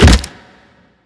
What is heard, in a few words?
fall; sophie